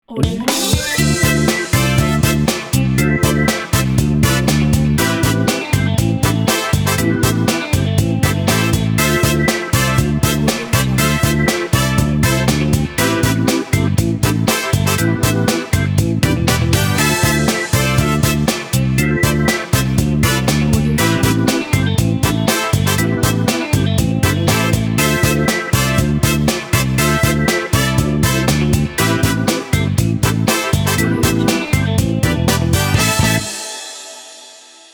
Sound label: soul funk mood